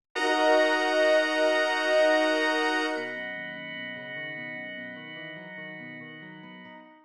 Ring Leitmotiv, mid

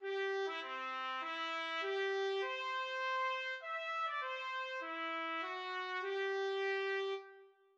Star spangled Banner opening bars

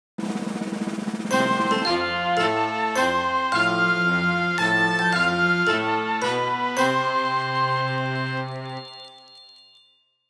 Film Score Fireworks Display Star Spangled

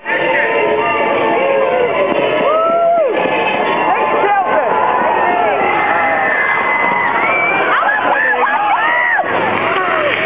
marching band; Tower Heist

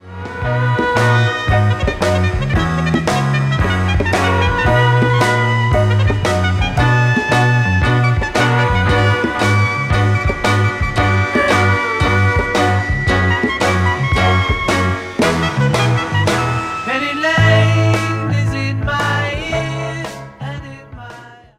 Piccolo trumpet solo

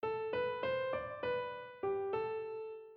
The Lick (music) (not swung)

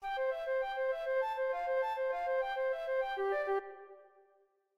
happy run

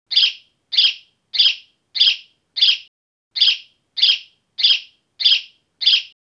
Passer domesticus tschilp call

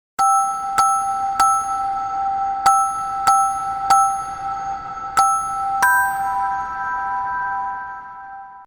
xmas melody

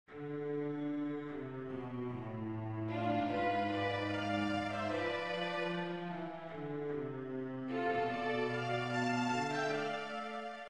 Ring Leitmotiv, mid

